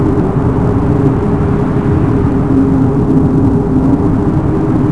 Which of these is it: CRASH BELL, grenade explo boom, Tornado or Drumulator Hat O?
Tornado